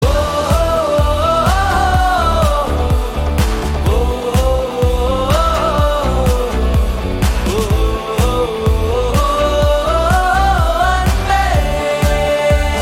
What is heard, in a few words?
Bgm Ringtone